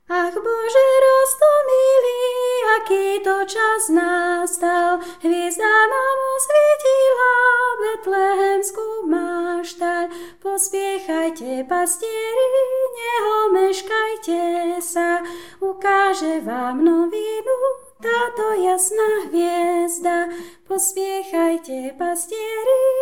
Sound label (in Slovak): sopran; Vianoce 2016 - Ach Bože roztomilý; Farnosť Letanovce